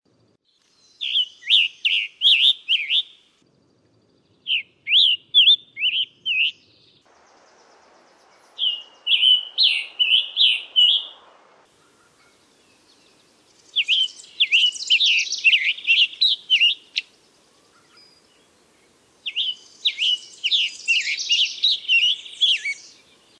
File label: Tanager Scarlet